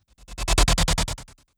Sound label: Strobe Transition